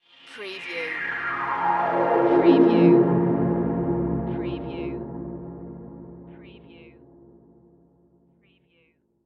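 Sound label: Production Element Sweeper; Sound Effects Bible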